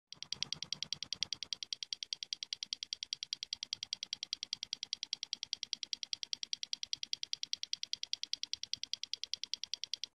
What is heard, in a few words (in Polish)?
buzzer bez generatora piezo